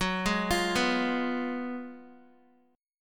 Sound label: Gb Major 7th Suspended 2nd Suspended 4th, Guitar